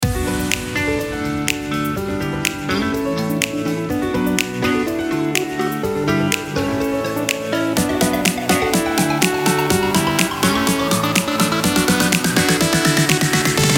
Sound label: electro house